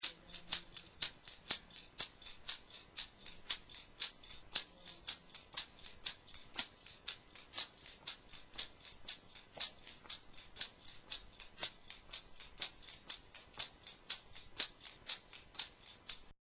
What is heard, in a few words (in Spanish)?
Sonajas